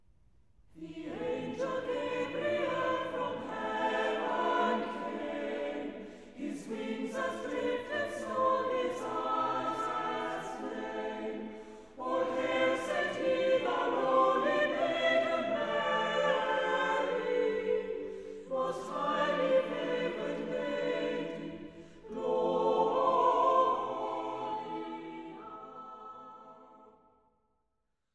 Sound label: Church Choir; Gabriel's Message